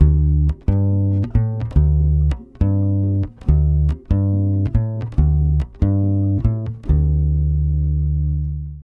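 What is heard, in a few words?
140 bass Dm11, brazil bossa; USB Soundscan; AKAI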